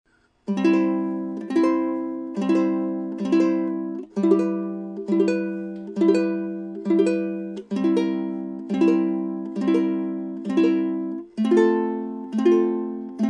Akita Concert, ukulele

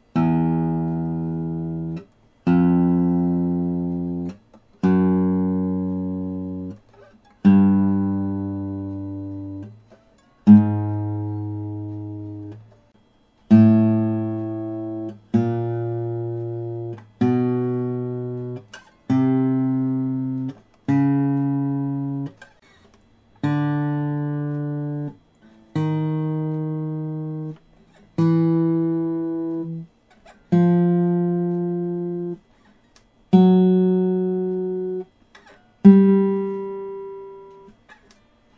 yamaha after all, guitar vibration

